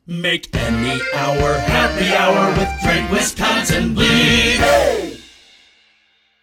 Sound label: Jingle